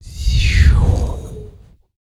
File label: VOX FX, BREATH FX